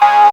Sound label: syn, Dance Synths, Trancers